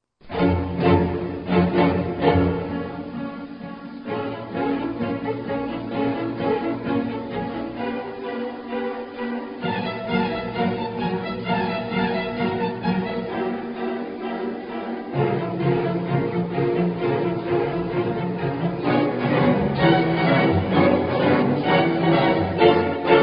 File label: instrumental suspense